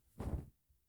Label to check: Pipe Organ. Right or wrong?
Wrong